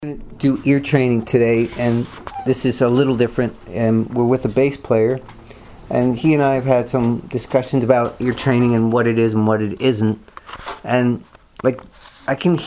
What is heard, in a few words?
ear training with bass player